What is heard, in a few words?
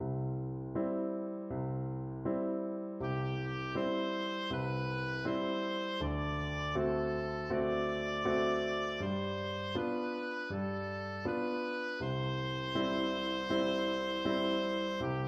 aura lea, oboe